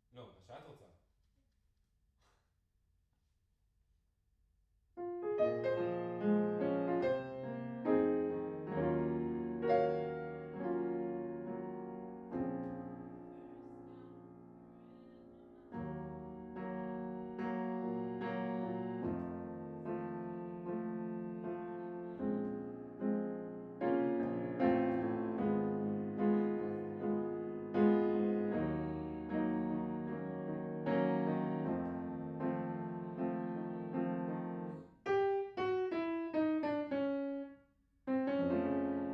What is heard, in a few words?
Piano Room